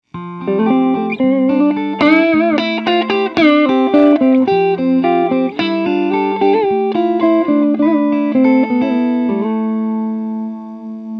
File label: Diamond Compressor Treble Neck